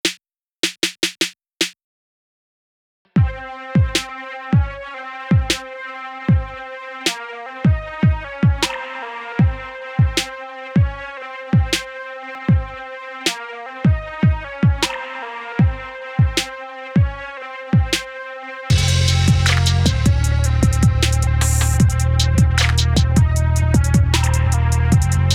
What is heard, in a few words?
Cminor 75bpm